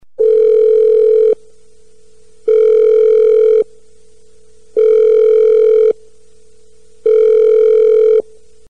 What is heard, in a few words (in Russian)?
gudki vyzova telefona